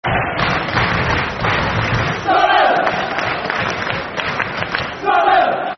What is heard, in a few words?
Sunderland, Football Chant (Soccer Song); Sunderland Safc